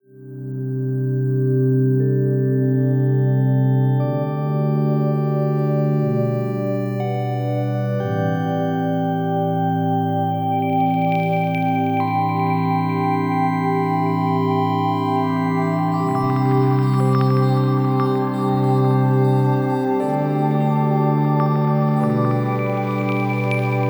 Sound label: Ambient